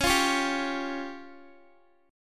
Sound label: Db Major Flat 5th, Guitar; strummed